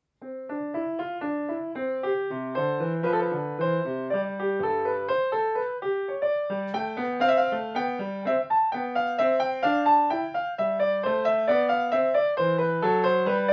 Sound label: bach invention 1 bwv 772, piano